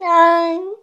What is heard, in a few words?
Cat Domestic Meow Sad